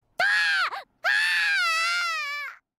noises; gabriel dropout; satania

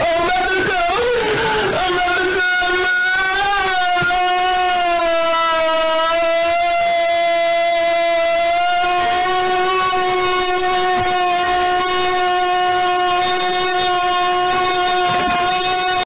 bass; Ambatukam

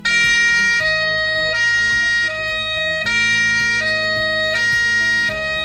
music; beekmantown vape detector alarm

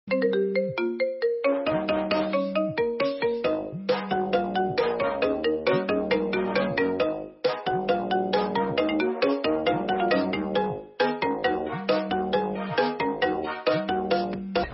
iphone ringt; remix; toothless

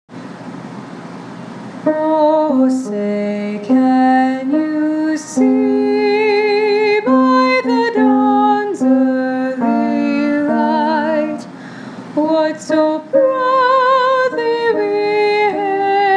National Anthem Soprano

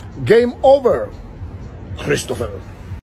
game over

